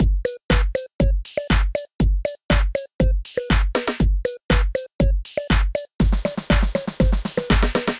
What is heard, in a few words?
Electrified Kit; Ableton; Bomblastic